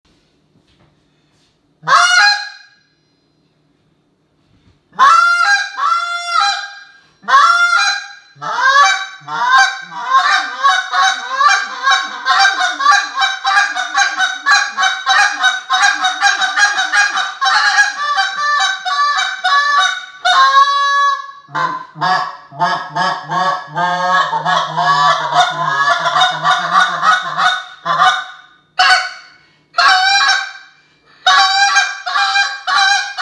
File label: Alpha Delrin